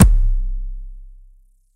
bolshoj tom

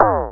knopka klik nizkii blizkii dolgii

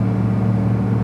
korotkij rev proezzhayushego mimo gonochnogo avtomobilya

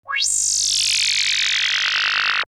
sintezator effekt

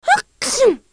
devushka chihaet yazikom; harakter golosa raznoobrazie; drama effekt

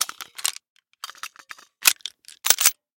perezaryadka posle sborki razborki oruzhiya

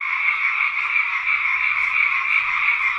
krik i strekotanie ptic vdaleke

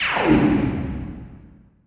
korotkij zvuk vistrela iz blastera

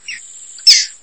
korotkij svist na bolote

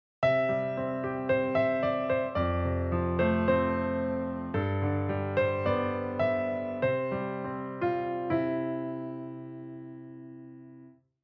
legkij i udobnij ritm otkritiya fortepiannogo fonovogo zvukovogo materiala

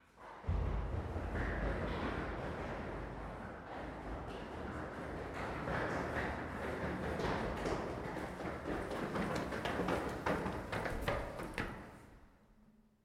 dvoe begut po lestnice v koridore

